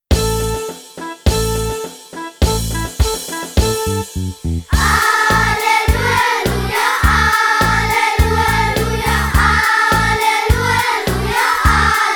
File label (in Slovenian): Aleluja; za otroke; glasba